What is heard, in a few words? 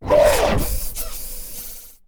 hurt, cylarkan she; combat; creatures; CosmicRage